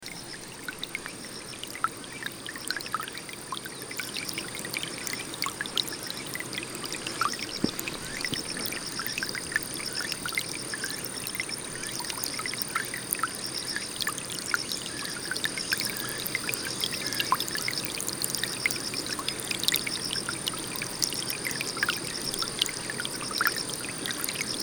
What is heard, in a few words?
Tsuzura Tanada; Ukiha